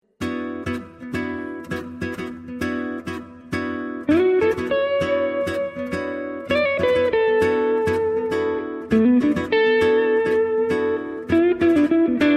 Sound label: guitar ringtone